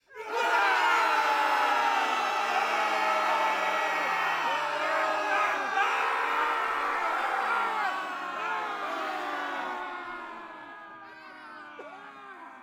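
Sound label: exterior group battle cry; sonniss gdc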